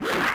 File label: Attack; RedDot